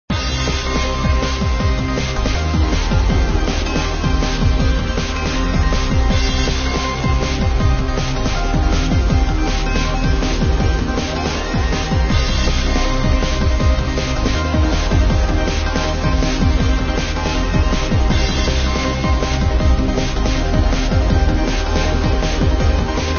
Boss Music; Super Adventure Pals

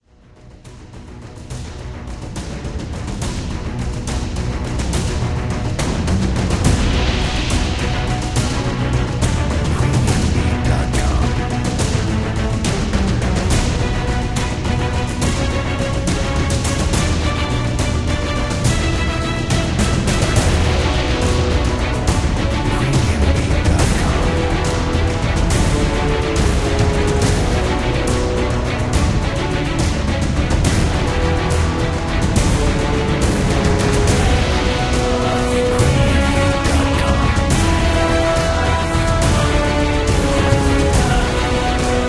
Boss Fight; HellSign

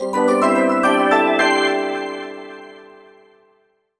points ticker bonus score reward jingle

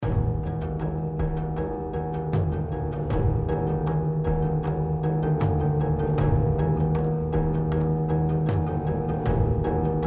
Rage Combat; Joel

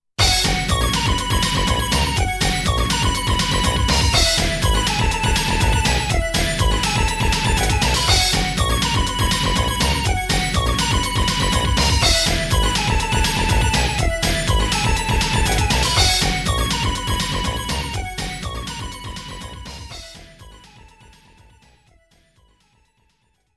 Clamor in the Crowd; fatal fury 3 road to the final victory

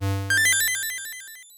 Jingle, Title; Mr. Driller Drill Spirits; nintendo ds

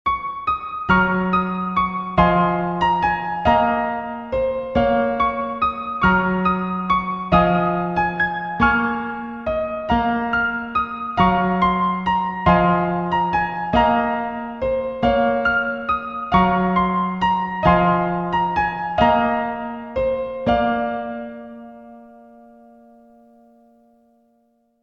Piano; Blind Men; PS Vita; PS4

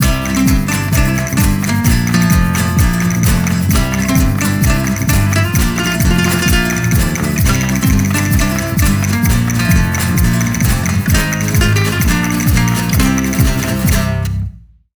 Match Over; Don Flamenco

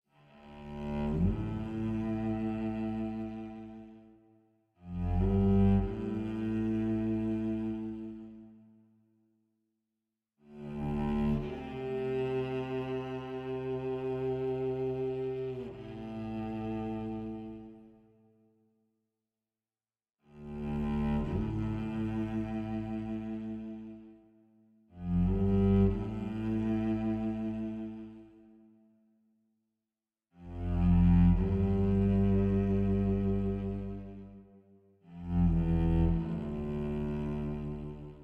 cello solo low; fatebinder; Tyranny Gold Edition

